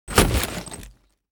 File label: nail box